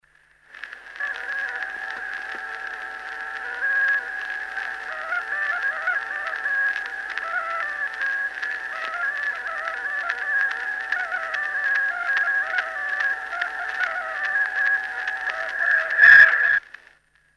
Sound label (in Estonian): Vene tants Kuusalu torupill folk; Jakob Ratsov